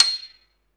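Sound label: piano C 7, Korg; Back In Time Records; Korg Universe VOL-1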